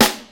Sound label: Prominent Steel Snare Drum A#; Duration 0:01; Mono